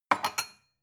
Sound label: glass shelve soft